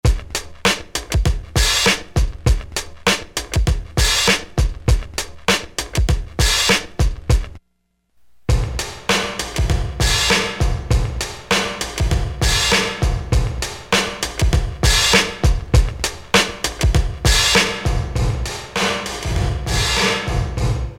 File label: Studio Drums, Roland SRV-3030; Preset